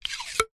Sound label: cartoon slide whistle mechanism slide no whistle; zapsplat